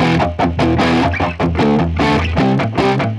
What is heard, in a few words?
150BPM Guitar Heavy Emin; Guitar Loops; Antidote Advent